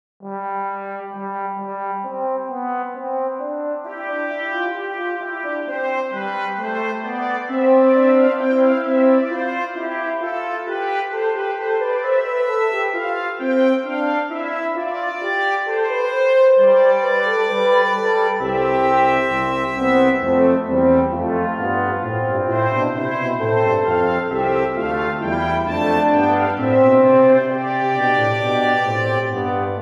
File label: brass